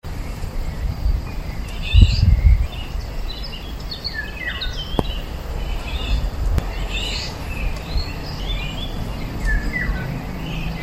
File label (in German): pirol; vögel; rufe vögel